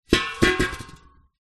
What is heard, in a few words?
industrial gas cannister metal down on concrete